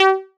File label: Menu Click